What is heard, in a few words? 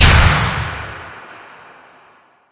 arena lights off; ambient; Left 4 Dead 2; Source Engine; atmosphere